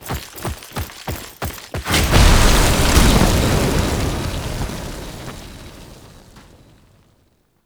Kahl Foley And Jetpack; Warframe; Veilbreaker; Snt Kahl Exit; Cinematics

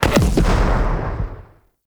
Space Fighter Dredger Jelly Rocket Explosion; Grineer

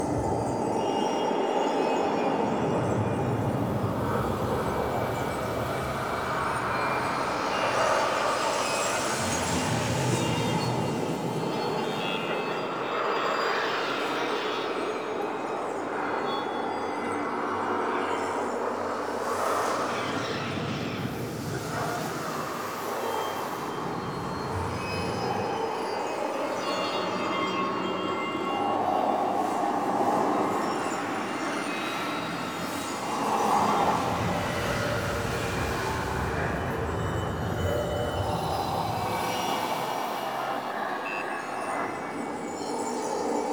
Ambient Loop; Warframe; Apostasy

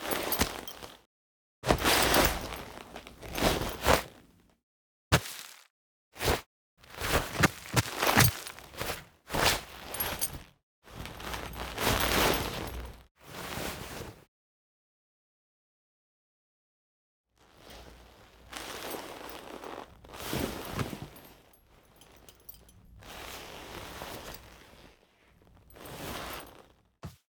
Courtyard Foley Tendrils; Drifter; Warframe; Cinematics; DuviriQuest

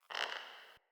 Cork Creak; Puzzles; Neathuns

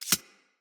Arachnoid Micro Step; Enemies; Corpus

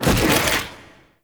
Broken Frame Dodge; Warframe

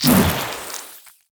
Pool Noodle Throw Cast

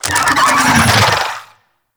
Infested Big Ashen Vocal Attack Death; Warframe

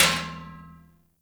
Metal Trash Can Impact; Ambience; Gameplay; Warframe; Nineteen Ninety Nine

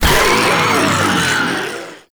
Inked Baptizer Death; Enemies; Warframe; Shadowgrapher

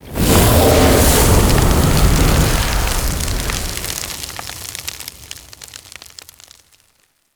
Set Tree On Fire; Ballas; Warframe; Sacrifice; Shrine

